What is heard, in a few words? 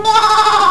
fall; quake2; sheep